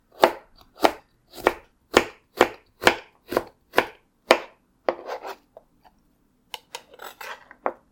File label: cutting burdock; cooking